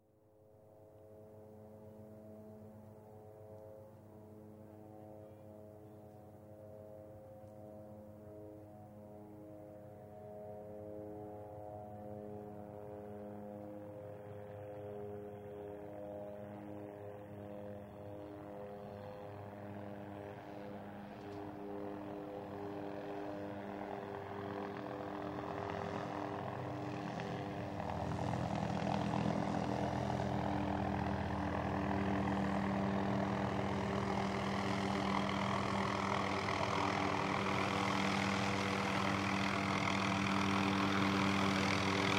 Hughes 500E Helicopter, exterior, approach, land, switch off; Airplane; Helicopters; BBC